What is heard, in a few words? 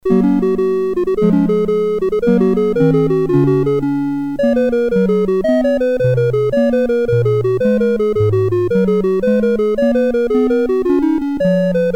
Gigue in G BASIC, Commodore CSG8580R5